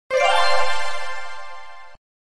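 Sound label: Message Ringtone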